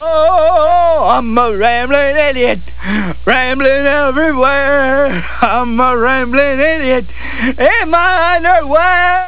hehe; quakerepo fortress misc

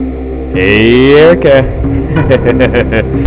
bad music; planethalflife; studiosound; outtakes

